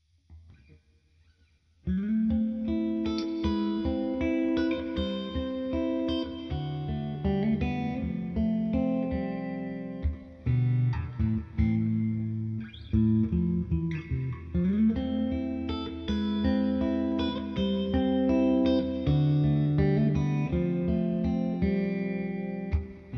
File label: clean, humbucker; CreamGen